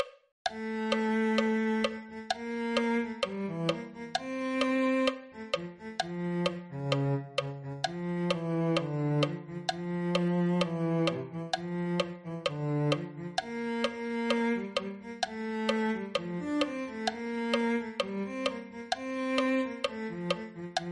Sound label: cello